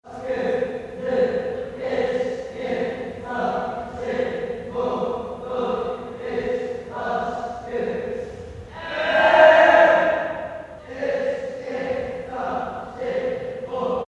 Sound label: school club yell